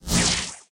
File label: thunder, battle